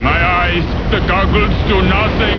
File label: got hit; Digital Paintball 2